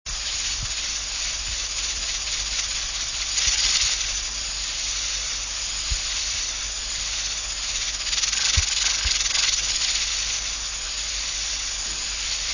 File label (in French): texture cocotte minute